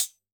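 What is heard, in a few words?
Closed Hat; drum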